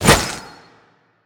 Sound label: fire; smite; manticore